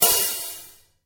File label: long open high hat f key, Royality free open hi hat sound tuned to the F note